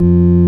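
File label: ORG Vox F, Continental organ; Continental2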